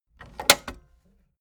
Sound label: tape deck close clatter